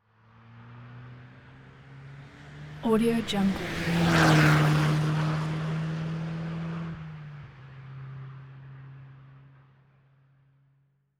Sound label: passby sportscar single; soundbits-soundfx